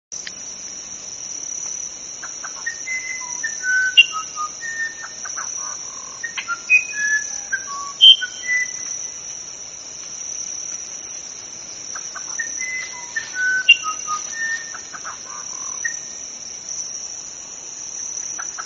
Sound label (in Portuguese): Uirapuru verdadeiro